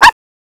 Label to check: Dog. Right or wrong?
Right